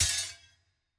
Slave Fall

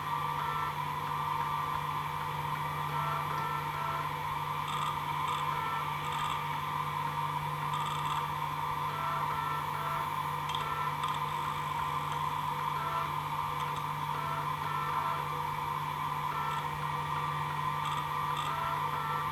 Loop; Desktop Sleeve Paint Startup; Rhythm Doctor

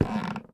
Footstep Wood-Creaky

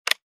Snipe

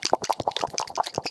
sticky bug crawling legs

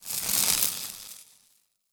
Elementaliste Sparks

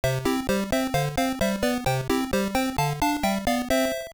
Game Start; Frogger

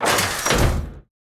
Lobby Elevator Door Open

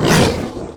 Monster Attack Finisher; Monster Ralph Attack Finisher

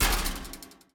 Footstep Metal-Fence

